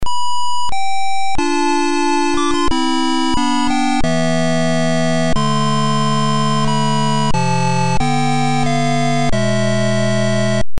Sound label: sad tune